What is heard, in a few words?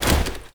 teleport movement, foley